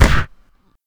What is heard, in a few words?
ballistic; Notrium